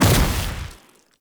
Shrimp Lantern Weap Cluster Proj Split; Creatures; Baliq Shrimp Lantern

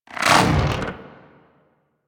Head Pull; Warframe; Teralyst; Ropalolyst; Flight

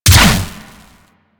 Rifle Proj Explosion; Warframe; Weapons; Wisp Rifle

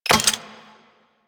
Flame Spear Charge; Grineer

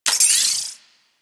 Greed Point Pickup; UI; Pickups; Warframe

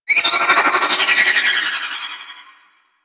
Short Bow Projectile; Warframe; Duviri; Dax; weapons

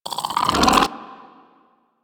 Sapient Pistol Reload; Warframe

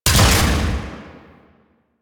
Layer Shot B, Shotgun; Phantasma Prime; Revenant

